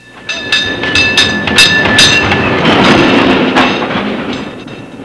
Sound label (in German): alte Straßenbahn